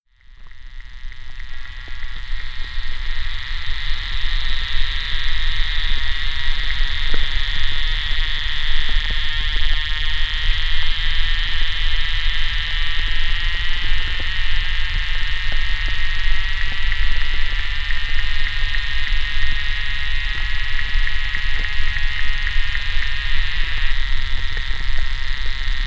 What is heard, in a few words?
pond animals abrupt stop